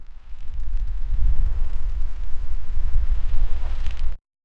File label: subtle dirt shifting wind, environment